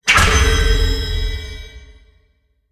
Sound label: lash kiss